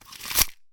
Tape Measure

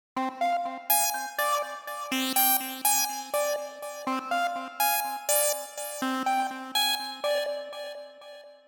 Acid line

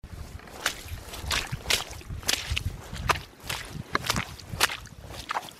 Squelch In Mud

